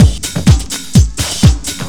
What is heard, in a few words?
LOOP; SoundFactory; DRUMLOOP